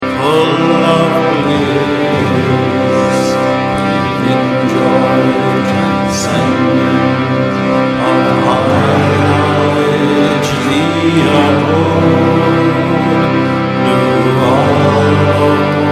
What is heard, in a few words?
Hymn to Brahma English